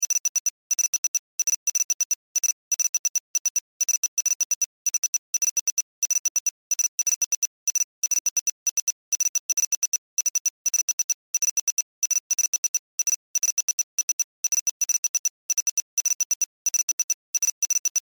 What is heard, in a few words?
Science Fiction Computer Process Beeps; Computers